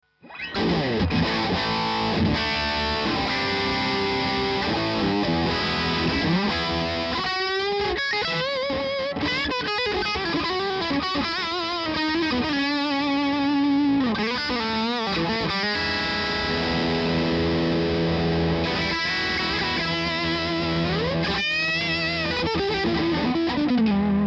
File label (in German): Steg PU Gain